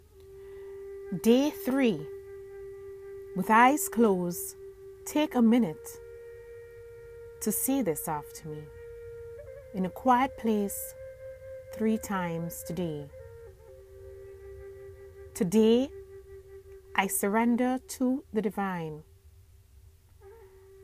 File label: healing mantra